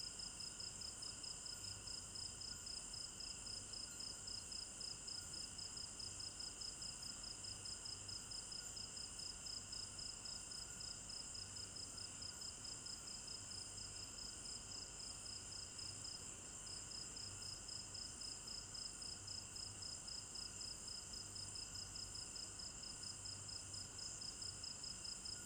African Village Garden Night Crickets Stereo Binaural